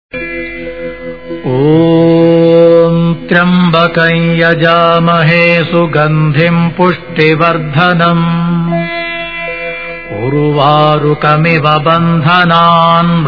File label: Maha Mrityunjay Mantra